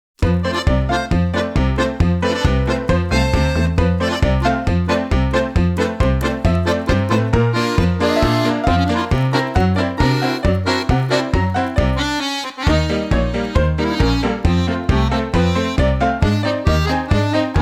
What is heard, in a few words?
noisy accordion; dotabata ako-dion